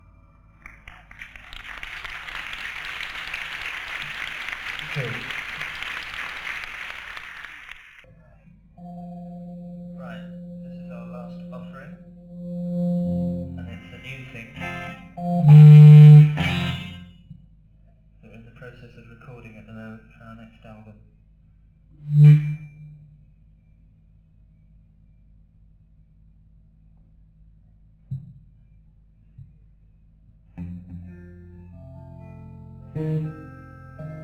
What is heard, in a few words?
Tuning; Lund, Sweden